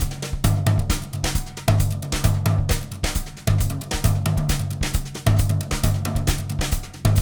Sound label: brush; BRUSH LOOPS; samba